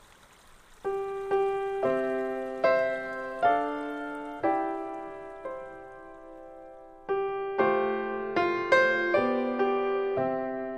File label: Mountain Waltz